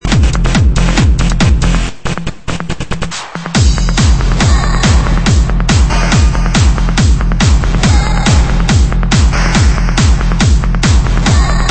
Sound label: techno